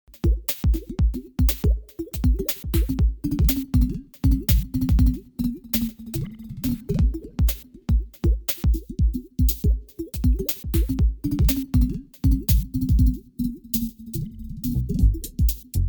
Underwater Funk beat

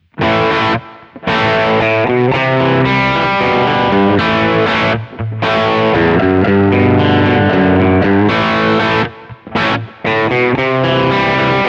Reverb Crunch Rhythm; Prussian Blue

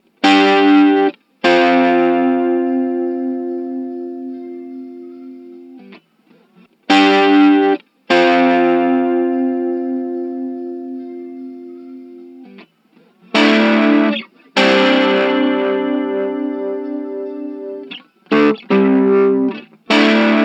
48k 12k Guitar EQ

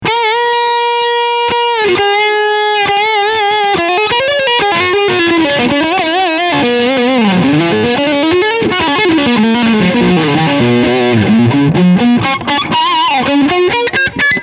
Leadsound Front PU

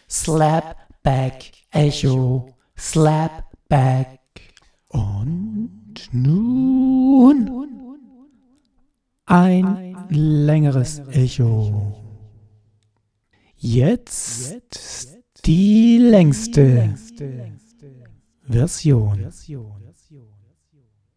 Echo

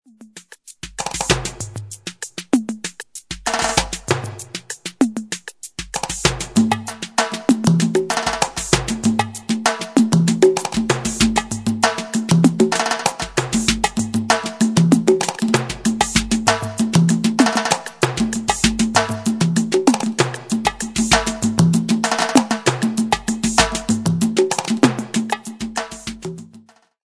808 spd11

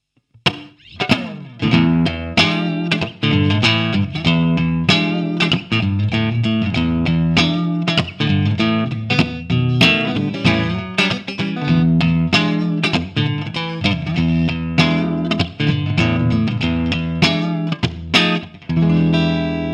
Gibson Flying V V2 Clean

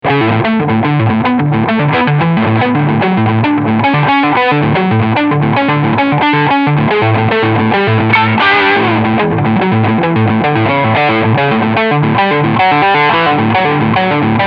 Full Gain

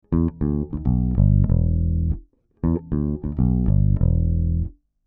DI Bass; IGS NE 573; 5dB Gain

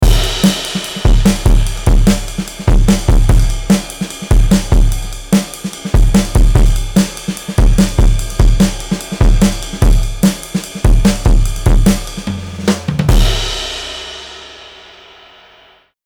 Chandler TG 1 Drums THD full

